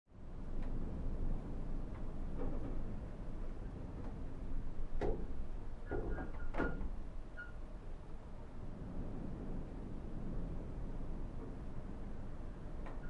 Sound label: Shack Wind 1 Dilapid, int & rattles